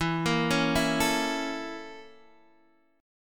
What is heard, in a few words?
A sharp Minor Major 7th Flat 5th